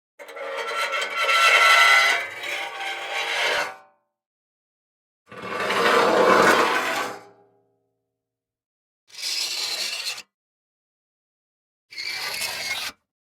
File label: metal scratch and scrapes